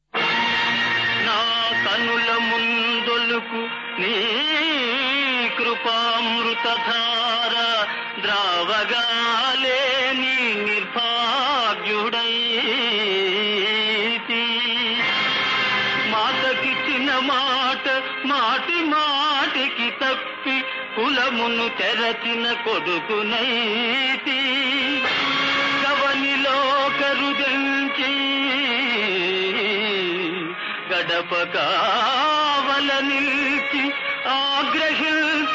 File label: Padyam; BhuKailas